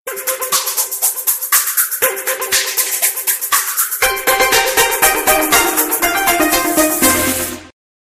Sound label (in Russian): sumasshedshaya sms prikolnoe sms soobschenie